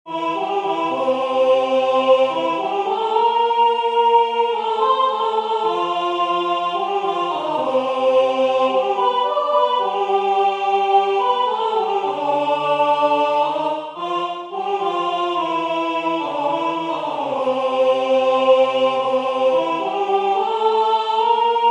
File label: Three-way Canon Blues women